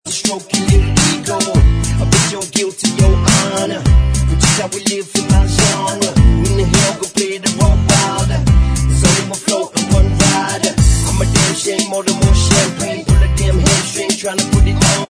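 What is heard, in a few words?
backing vocals track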